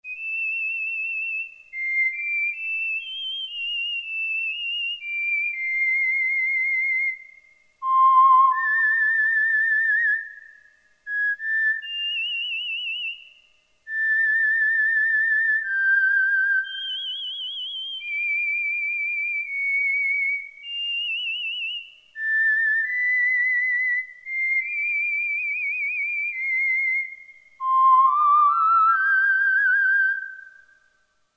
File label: WHISTLE, Roland Jupiter-8, Factory patches